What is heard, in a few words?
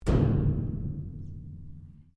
Strike Metal Hollow Tank Soft; Materials